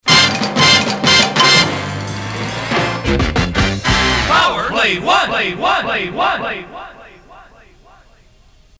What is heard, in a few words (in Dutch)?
instrumentaal; Soul Power Smash Play; SPSP 1